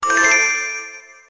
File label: Notifier Soapbreak Ringtone